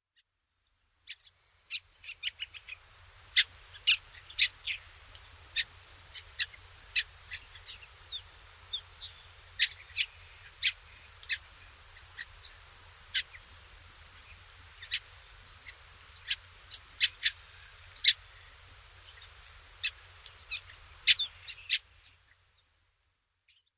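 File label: Asian Rosy Finch; soukotan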